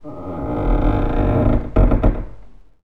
battl creak

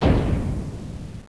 SLAM

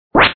yes Sound; barrels of monkey

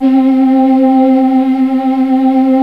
Solo Vox, Synth lead; GMPlay; GMTones

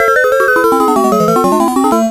ship capture; MAME

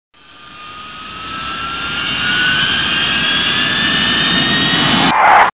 Zoom In; HOLIDAY; Vroom

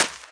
HIT SAND; JN4DEMO

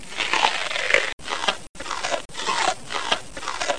Scrunch

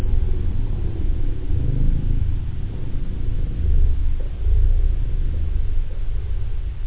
vocal

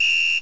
lngwhstl, drums

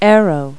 arrow; Multi-Lingual Talking Picture Dictionary

